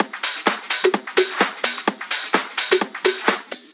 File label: House Loop, 128 BPM; Vengeance Samples